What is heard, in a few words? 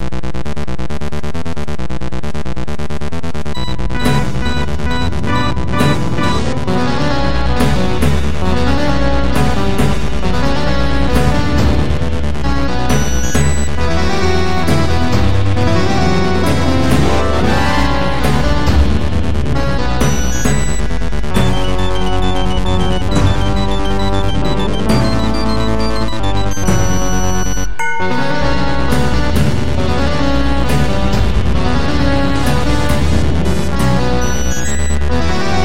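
Hard Lead, Electro Harps, Percussion Organ, E Flute, Power Rocker Solo; Synth; X-Men; Zephryus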